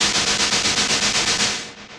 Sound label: Particle Flow, Effects; Ableton; Glitch and Wash